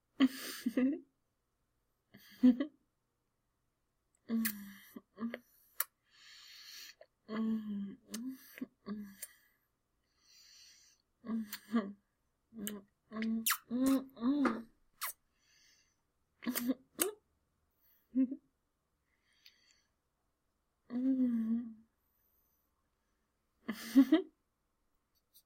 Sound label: Mouth Noises Oral and Kissing